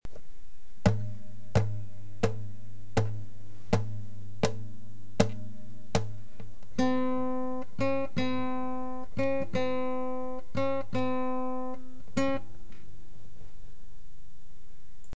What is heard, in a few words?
more dotted eights, classical guitar